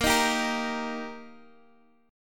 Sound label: D sharp Major A sharp, chord